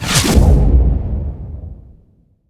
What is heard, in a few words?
freeze cam